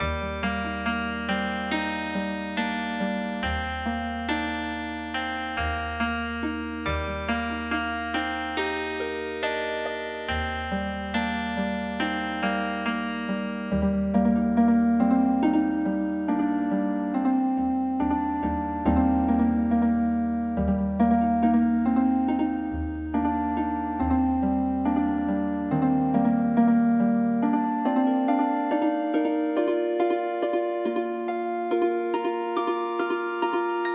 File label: hymn Healing Harp; midi; traditional